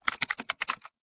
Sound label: Typing Short; Learn to Fly 2